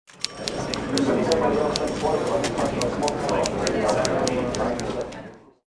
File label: CITY HALL; buildings